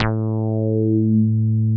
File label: TB 303 BASS